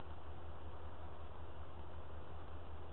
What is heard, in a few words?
Moaning by male